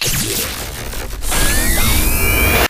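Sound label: spectre overload mid distance; Titanfall 2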